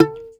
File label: SHUR NOTE, TOPSHURNT, Asian Traditions; Soundscan vol.60; AKAI